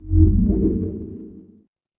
magic scifi alien

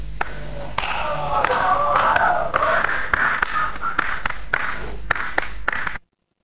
crowd applause

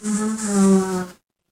animal insect

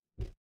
feedback error

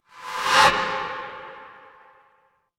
transition whoosh

